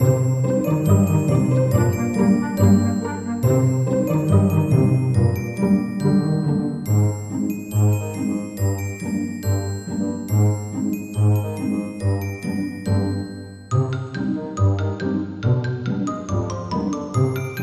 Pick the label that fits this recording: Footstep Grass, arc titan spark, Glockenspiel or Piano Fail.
Glockenspiel